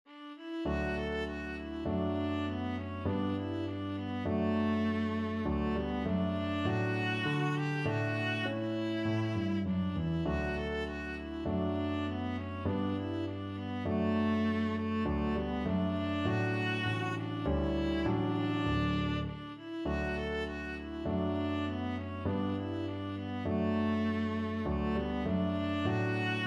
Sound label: shove that pigs foot, viola